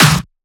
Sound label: SnareClap; FLPRO